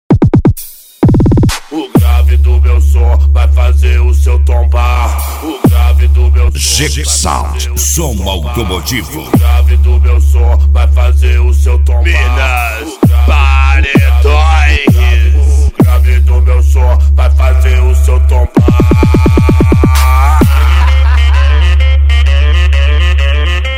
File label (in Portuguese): som automotivo